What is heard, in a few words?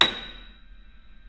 keyboards acoustic A#6; USB Soundscan vol.17; AKAI